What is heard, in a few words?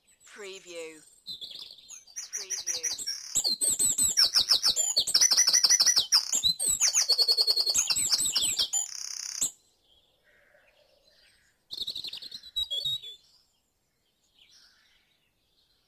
Dawn Chorus in Mold North Wales - Short Loop